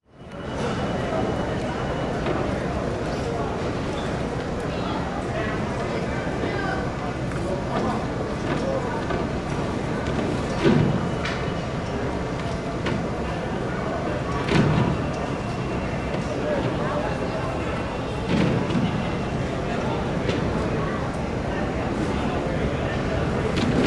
airport ambience walla terminal luggage security check beeping; fvtt sonniss gdc audio